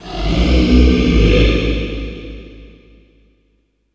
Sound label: LION BREAT, MONDO LION; Spectrasonic Distorted Reality 1